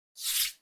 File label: Slide; transition